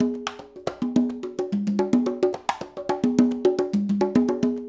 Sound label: loop, Percussion Slam; InVision Interactive